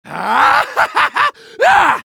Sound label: medic taunt head exert